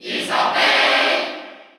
Cheer Russian; SSBU

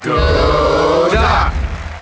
Cheer; Dr. Mario; Melee

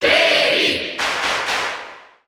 Cheer Russian; Terry; SSBU

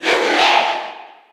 Cheer Japanese; Robin; SSBU

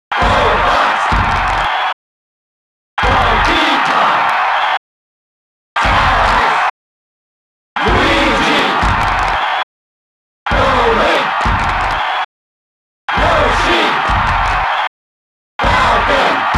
Crowd; SSB64

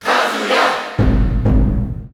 Cheer French & German; Kazuya; SSBU

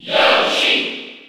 Cheer French; Yoshi; SSB4

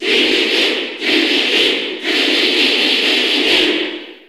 Cheer English; King Dedede; SSBU

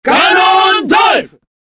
Cheer Korean; Ganondorf; SSBB

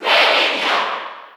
Cheer English; Greninja; SSBU

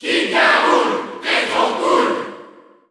Cheer French; King K. Rool; SSBU

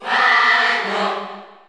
Cheer Spanish; SSBU

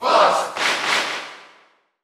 Cheer Dutch; Fox; SSBU

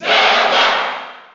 Cheer; Zelda; SSB4

